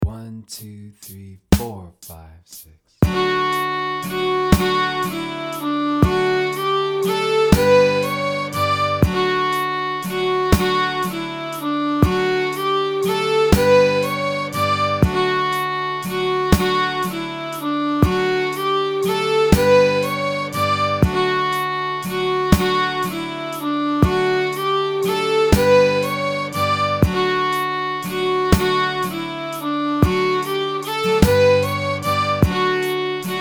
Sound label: jig